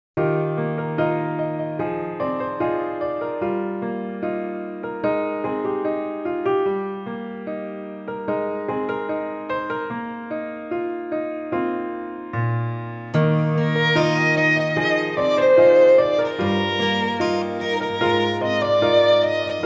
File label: Violin & Piano & Guitar Version